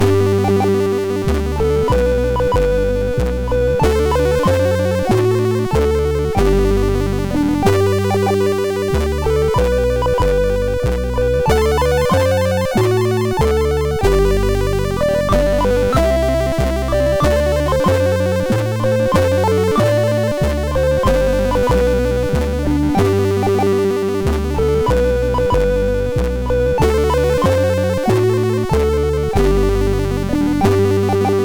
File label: German Xmas tune, MOS6581R4; German Xmas tune 2